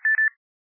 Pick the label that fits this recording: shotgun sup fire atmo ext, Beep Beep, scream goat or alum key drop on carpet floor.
Beep Beep